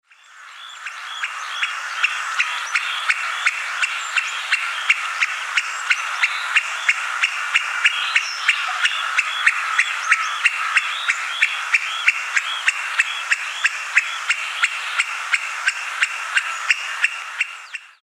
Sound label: himalayan woodpecker